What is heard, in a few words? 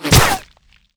ground hit, damage; DCS